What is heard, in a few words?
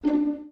dial tone orchestra; Oppo R7